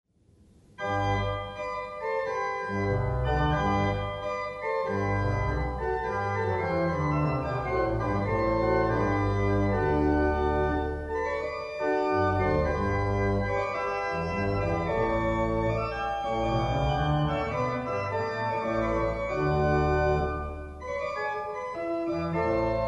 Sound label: Organ